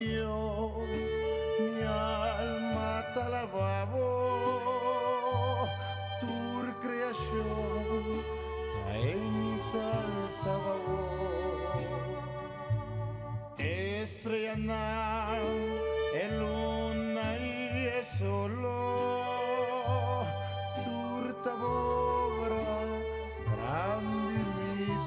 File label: Mariachi